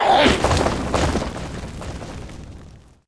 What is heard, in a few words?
poison plant dead, monster